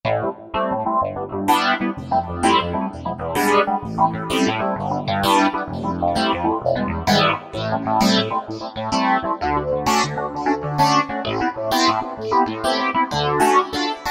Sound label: Keywave Klav, Roland V-Synth; Factory Patches